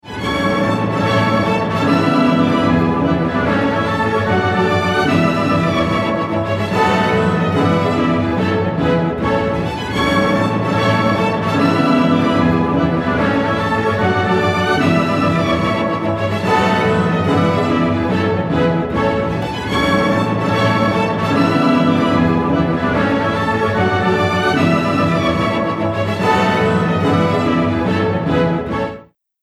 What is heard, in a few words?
Italian Symphony, ringtones